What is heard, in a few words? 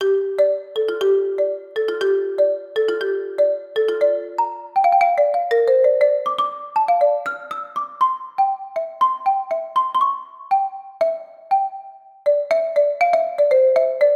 vintage xylophone solo; versilian studios